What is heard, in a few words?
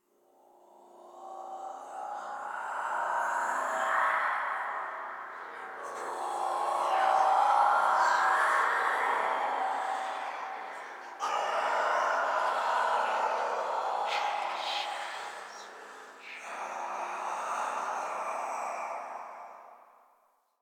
Whispers; Ambience; Muruk Vull